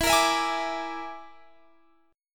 Bb 7th Flat 5th E

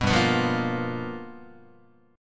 G 7th Sharp 9th G chord, Guitar; G7#9 strummed; JGuitar

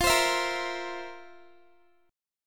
F Major 7th Suspended 4th, Guitar; strummed

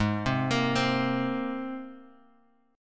G sharp Major Add 9th G sharp, Guitar Open G

